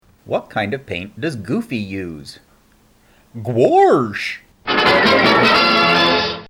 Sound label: Vaudeville Break; File Pile Radio